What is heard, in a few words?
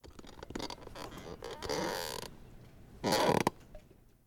Squeaky Chair